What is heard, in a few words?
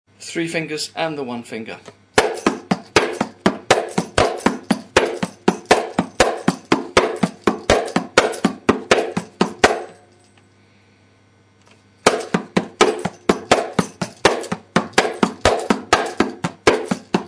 kanjira accent